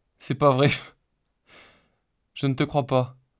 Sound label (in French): rire naturel